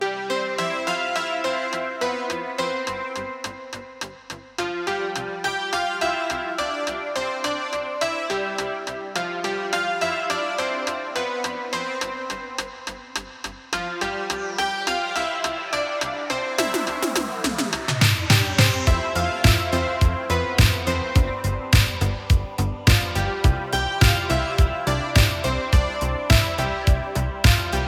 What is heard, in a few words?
Saxophone Souvenir